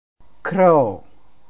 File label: crow